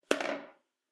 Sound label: putdown; One Night Hot Springs